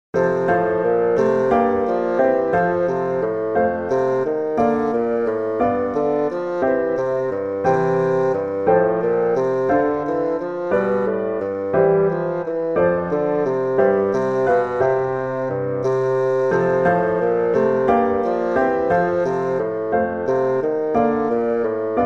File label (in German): Barock und Klassik fuer Fagott